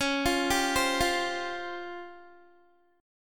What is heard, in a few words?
C sharp-Major 7th Flat 5th-C sharp-20,20,19,17,17,x-8-down-Guitar; C#M7b5 arpeggiated; JGuitar